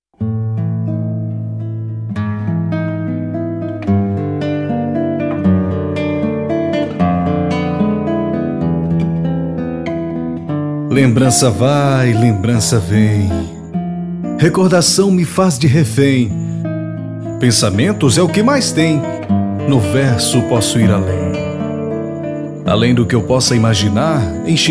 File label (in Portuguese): cordel